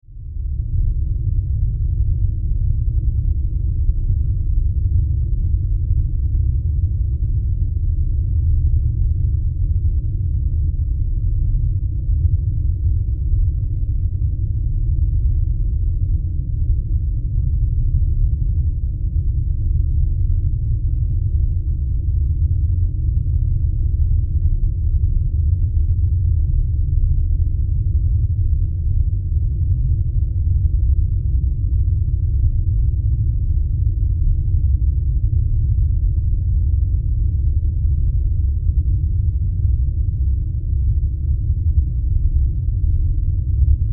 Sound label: Horror Drone Demonic Low Rumble; Evil Sound Effects; Evil (Sound Effects:Horror)